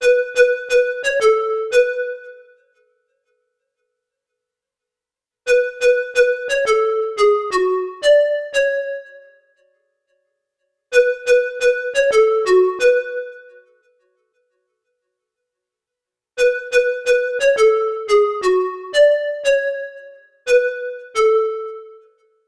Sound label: pan pipes